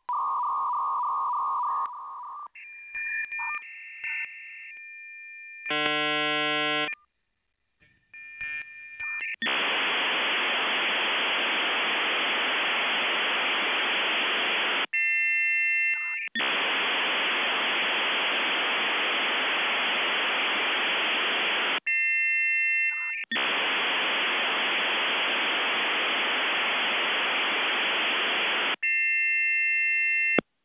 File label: sip modem